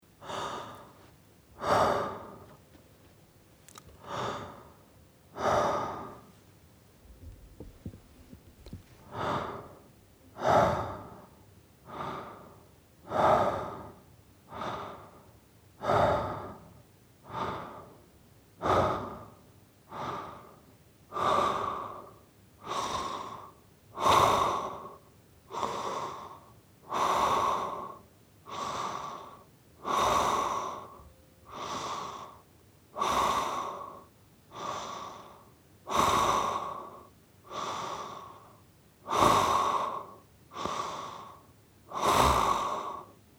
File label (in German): vox atem Schlafen